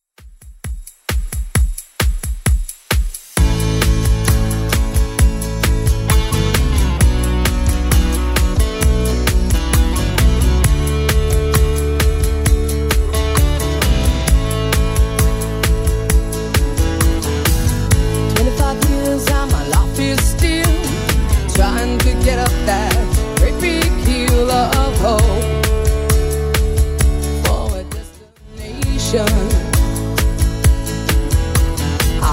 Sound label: 90s Upbeat 132bpm; Redrum